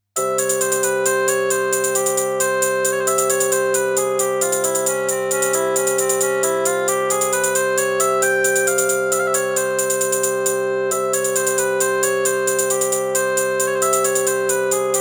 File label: arabian